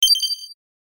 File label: Ui Confirmation Alert